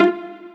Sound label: Pizzicato; osu